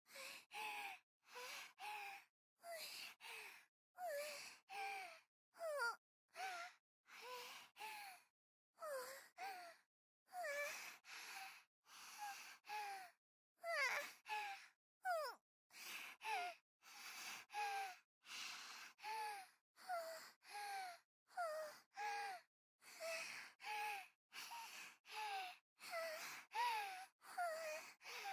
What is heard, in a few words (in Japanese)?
乱れた呼吸; 演出ボイス; ナナ